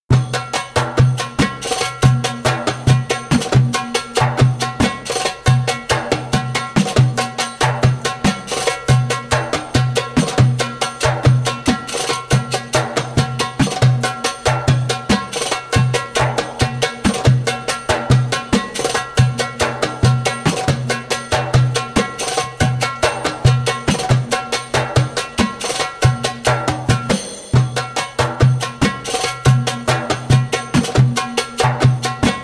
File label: Djembolale; Awkward Ayub (slow); Djembolale - Grooves and Moves